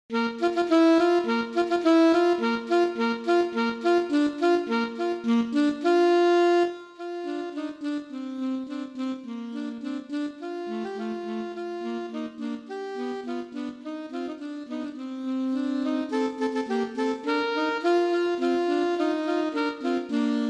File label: March Militaire; Just for Two EASY